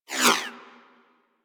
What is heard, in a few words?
Laser Whizzby; Warframe; Weapons